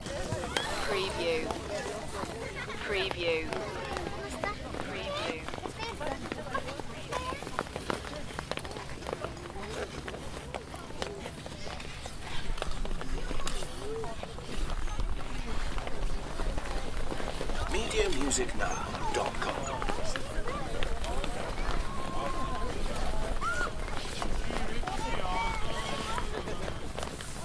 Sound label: Crowd, Ice Skating Park